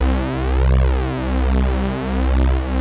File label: PULZE FLANG, LEADS; Masterbits; Vintage Voltage